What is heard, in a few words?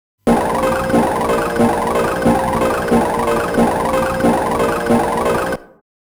Warning; darius 30th anniversary box